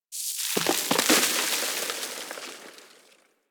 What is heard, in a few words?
Grenade Debris Snow; Warframe; Venus; Venus Grenades; Venus Grenade Debris Layers